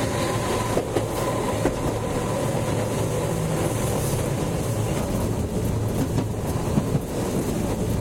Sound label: streetcar top slow, tetsuon